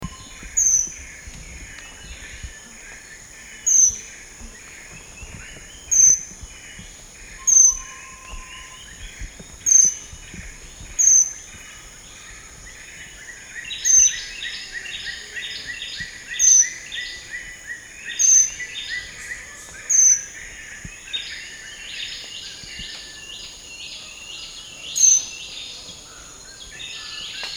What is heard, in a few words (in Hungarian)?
vörhenyes jégmadár hangja